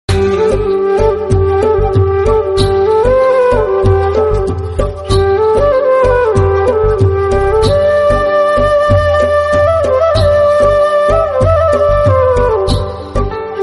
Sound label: Islamic Flute Ringtones